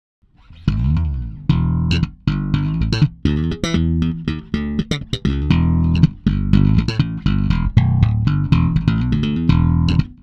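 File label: Ibanez SRX756 Slap; IBZ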